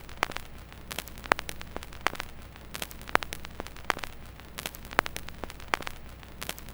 CRCK, Production Effects; XXL 800 Production Effects

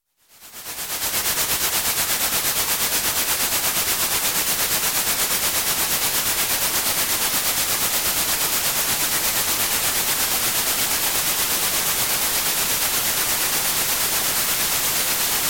Space Space Locomotive, Electronic; Sound Ideas 2000 Series

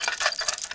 Squeak Metal Lamp Swing; SFX Kit

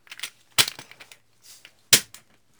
Gun Handling Weapon, Weapons Foley; SFX Kit

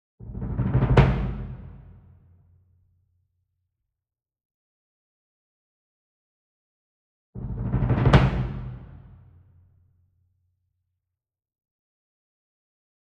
Bass Drum Marching Roll Soft Beater Medium; SINGLE DRUM; boom library; Cinematic Strikes Construction Kit

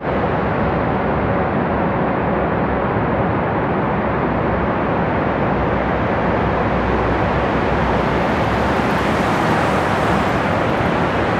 car ambi tunnel, street traffic; best service Blue Box

